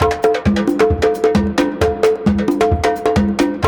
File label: CONGA BEAT; Ethnic; Zero G - Ethnic